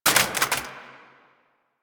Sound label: AK47 Reload Mag Drop; Warframe; Tenno; Weapons